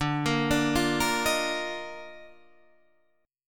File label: D sharp Major 7th Suspended 2nd D